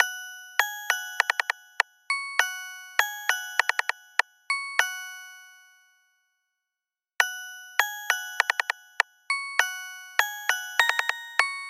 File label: sparkle, ringtones; Microsoft Surface Duo